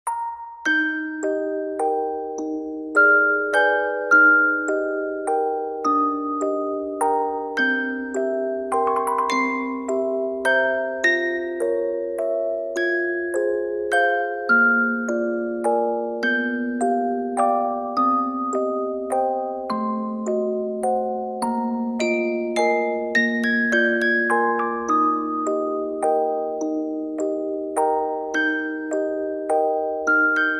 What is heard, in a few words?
Music Box; Nocturne op9 No2; Chopin